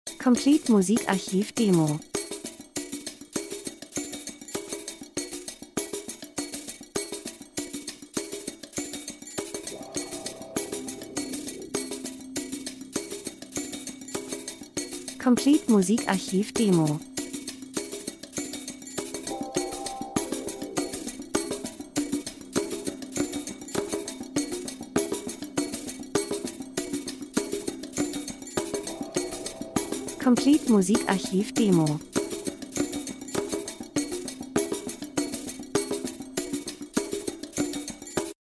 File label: drums; Variation Drums und Percussion Afrika Traditionelle Trommeln Sounddesign Land und Leute Buschmann Reisefilm Natur Dokumentation